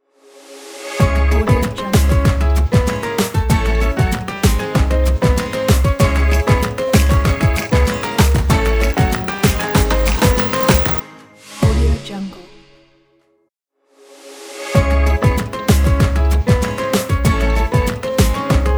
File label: latin percussion ident